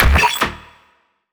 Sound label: bass shot